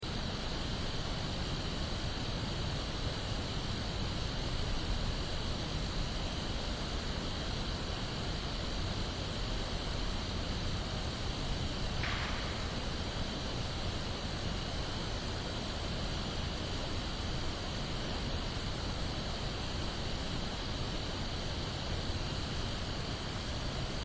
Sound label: knock